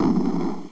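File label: fireball; dungeonmaster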